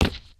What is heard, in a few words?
wood, human step